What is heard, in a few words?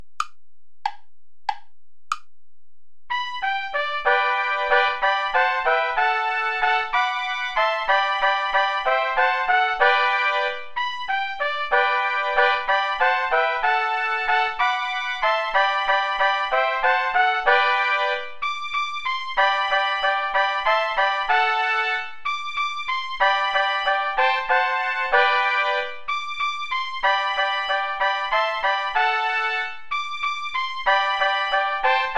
traditional play along without trumpet; asikhatali